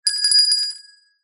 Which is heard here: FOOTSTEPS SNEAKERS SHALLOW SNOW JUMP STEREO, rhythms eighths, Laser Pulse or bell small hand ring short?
bell small hand ring short